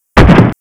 land; Pinocchio 1995 SNES; gamesoundopedia

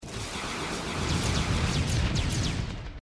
disposable rocket launcher; risk of rain 2

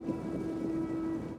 horn; rome total war

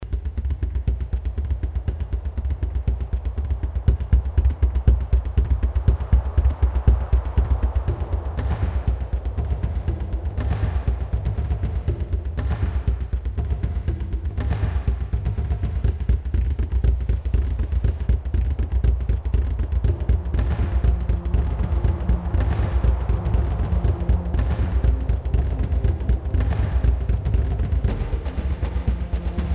in game theme

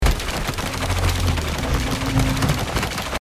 smg; risk of rain 2

